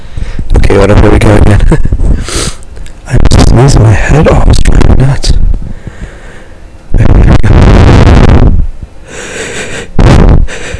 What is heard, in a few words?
Sneezy